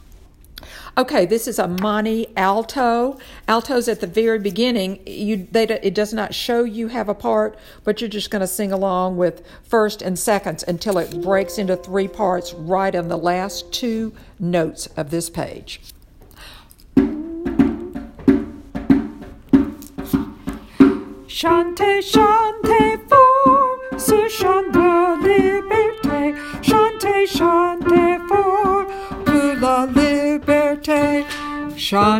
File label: Alto